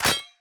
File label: loadout switch